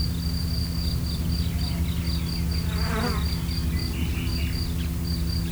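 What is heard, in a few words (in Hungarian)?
motorcsónak is, bagoly; termeszetben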